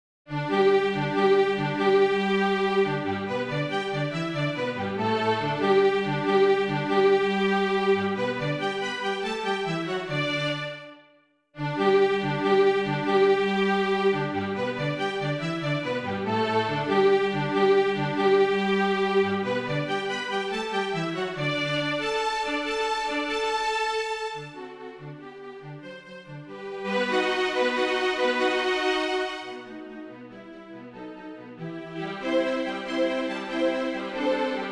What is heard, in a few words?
Schumann Little Hunting Song ensemble